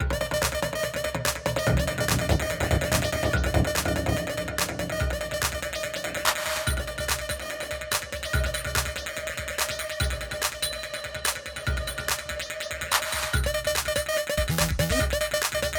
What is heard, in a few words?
Music Fight; Sifu; H2 New Level Area=Aquarium; aquarium tension